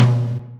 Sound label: tom 4; virtual drum sounds